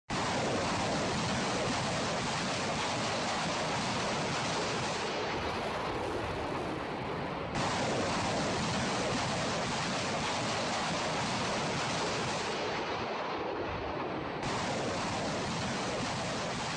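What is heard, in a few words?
multiple rocket launcher Katyusha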